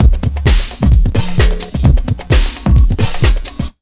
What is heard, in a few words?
JUMP 130, Big Beat Drumloops